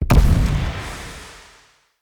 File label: Casting Device Projectile Destroy Heavy; Weapons; Player